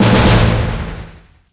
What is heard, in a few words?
Duel result lose